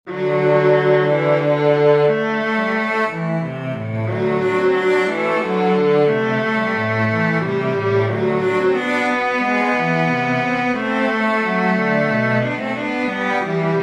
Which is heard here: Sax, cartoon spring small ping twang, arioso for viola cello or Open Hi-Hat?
arioso for viola cello